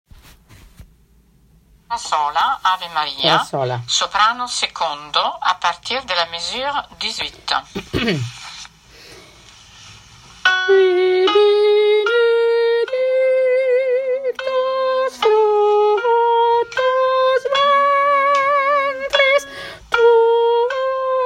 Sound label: Ave Maria soprano; détail